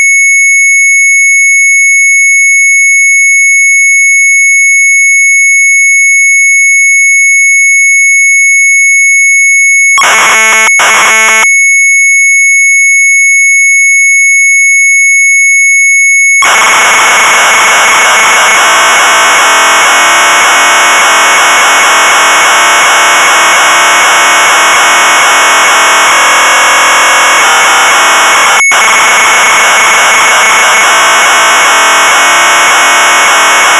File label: MZ800 Tape, sharp; mz800 wav